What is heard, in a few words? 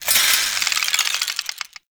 Mace Spindown; Warframe; Duviri; Dax; Mace And Shield